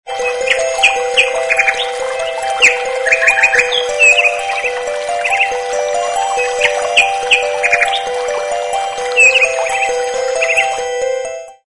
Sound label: Birds